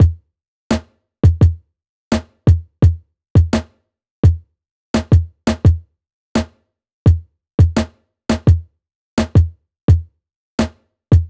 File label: drum